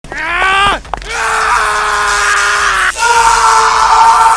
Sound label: struggle men